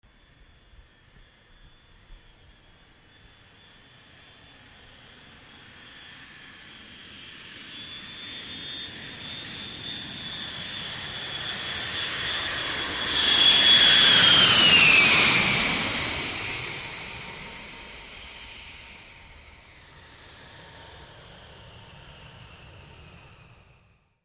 Jet Pass Low Landing, jets

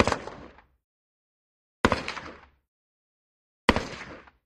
Russian SKS Single, sks 7x6

